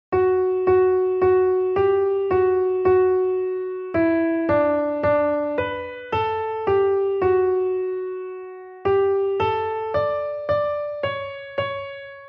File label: melody; the churchs one foundation